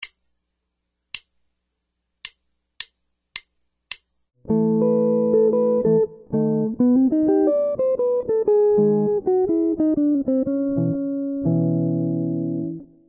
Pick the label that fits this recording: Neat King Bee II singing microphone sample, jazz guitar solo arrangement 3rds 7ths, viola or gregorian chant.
jazz guitar solo arrangement 3rds 7ths